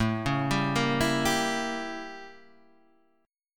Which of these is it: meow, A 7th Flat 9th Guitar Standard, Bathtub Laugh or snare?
A 7th Flat 9th Guitar Standard